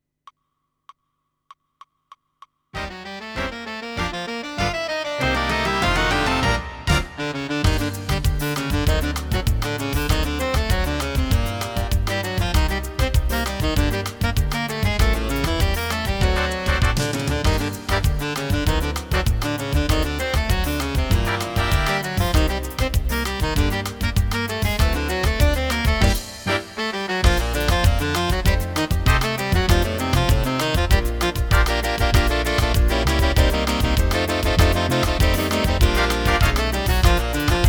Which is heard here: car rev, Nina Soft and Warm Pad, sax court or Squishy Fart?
sax court